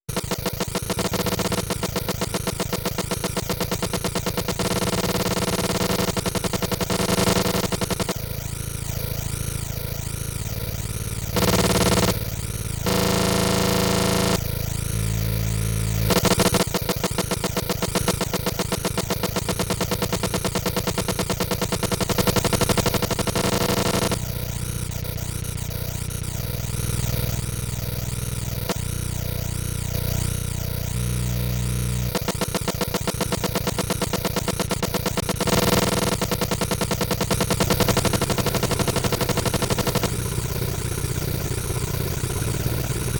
Drum Fast

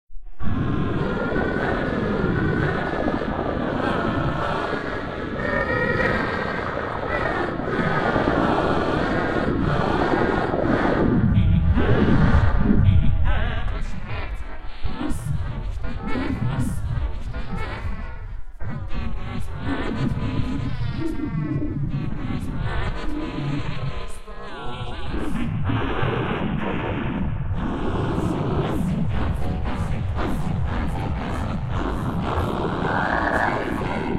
coro; Schutz

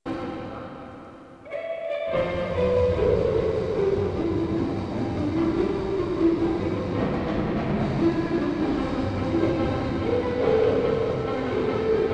ensemble

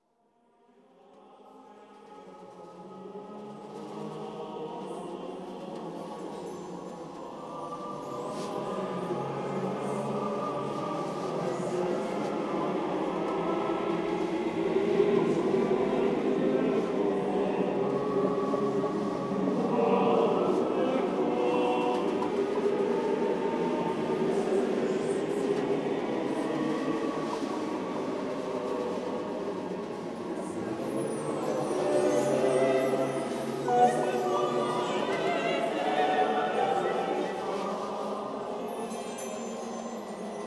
classical

